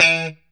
POP E 3, STRAT guitar; Spectrasonics; Hans Zimmer Guitars VOL-1; JC-STRAT POP

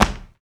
SUB, Human Perc, Body Perc; Spectrasonics; Supreme Beats; World Dance

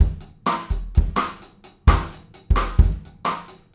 Bossa Nova Tip 128, Lounge Drumz

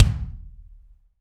BD AMBIENT, Drums; Real Mega Drums

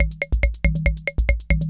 140 POPS

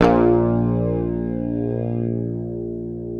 PICKED, Bass, A#1; Sampleheads; Will Lee Bass Library

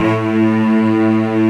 LO ST STRING

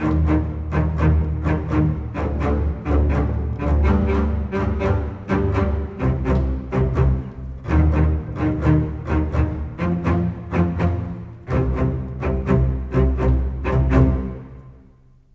Bass Cello; Sonic Foundry (Sony Creative Software); Orchestral Series VOL-4; Rock and Pop; Rock-Pop 18

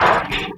Metals Fx; Sonic Foundry (Sony Creative Software); Esoterik Beatz

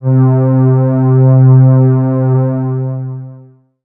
pad, Dance Synths

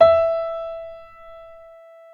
PNO MF; Synclavier Sampler Library

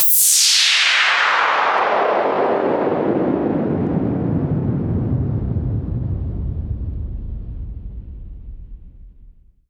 Sweeps Down

